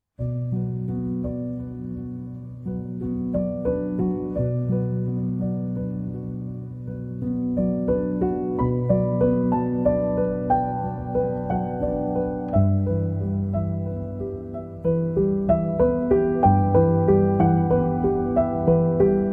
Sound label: Piano Solo